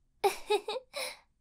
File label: giggle cute anime girl sound effect